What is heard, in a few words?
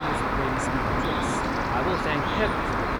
noisy